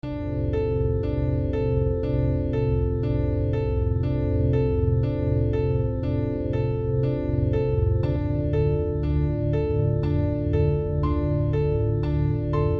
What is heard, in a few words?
Classical Test